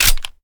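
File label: pistol 1911 reload empty mag in; gmod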